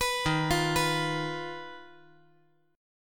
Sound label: Eb Suspended 2nd Sharp 5th; Ebsus2#5 arpeggiated; JGuitar